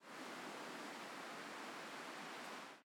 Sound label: Defeat Wind; Smite; Susano